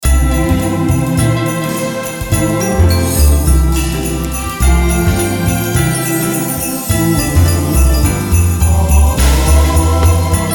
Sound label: Jingle Bells